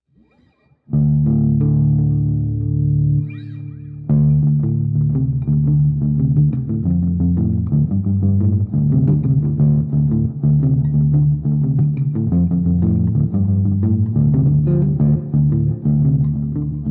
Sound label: fearless riff